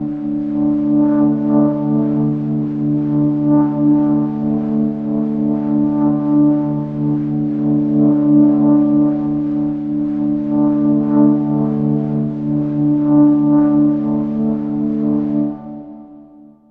Synth Intro

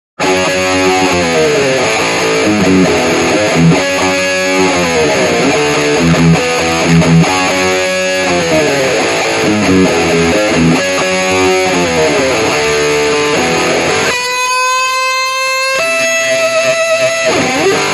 Intro Left, guitar

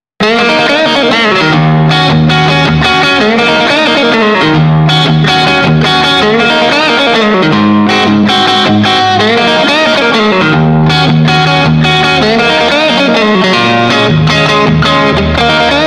Guitar; Mr. Tabs

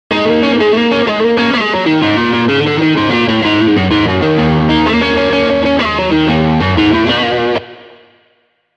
Rhythm Guitar; Chorus

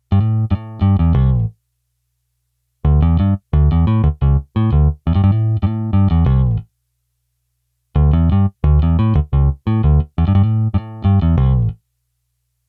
Bass

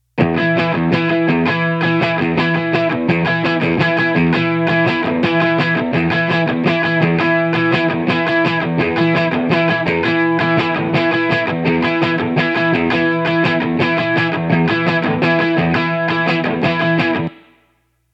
GTR INTRO; Reverend Billy Corgan signature Z-One

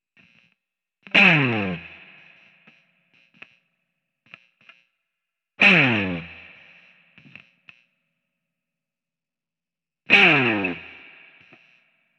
Fill

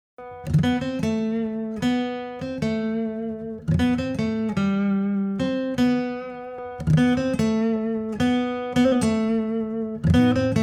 Acoustic Intro; Amplitube

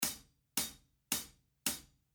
CLICK

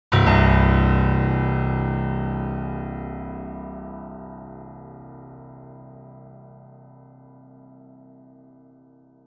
Piano

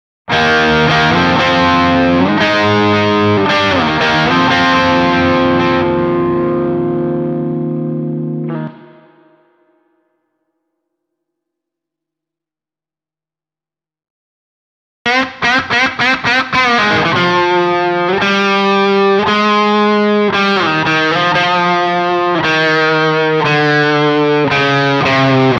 Main Guitar Intro

